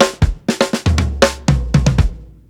120 TOM FILL, DRUMS LOOPS; BASEMENT DRUMS VOL 2

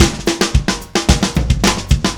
Drums Fill, Indie Rock; Session 01 - Brighton; Sonic Foundry (Sony Creative Software); Indie Rock Anthems

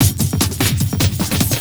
Beat, Industrial

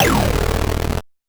Noise A, 8-bit, Bitty Noises

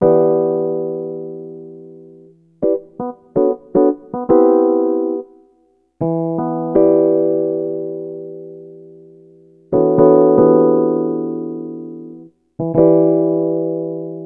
EP, Electro Lounge; Sonic Foundry (Sony Creative Software)

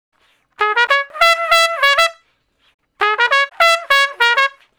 Trumpet Straight (Db), Horncraft for R&B Solos; Crimson Blue and Fabulous Horncraft 4 RnB

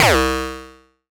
Casio VZ10M FX

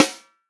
snare drum, POP SNARE CHROMATIC; REAL DRUMS

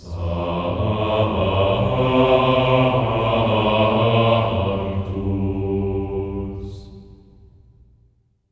SANCTU F3; Spectrasonics; Symphony of Voices; GR SANCTU

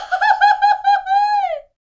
Mex F Vox, Vocals; Roland BK-5

